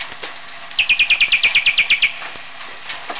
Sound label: Weddell seal uw P calls